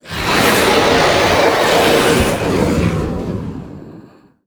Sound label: roar hiss; gonarch